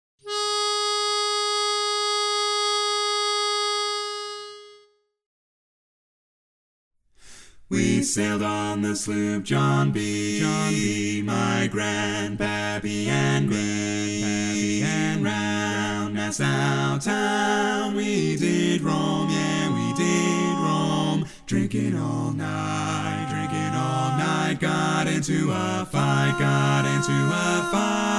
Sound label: Lead missing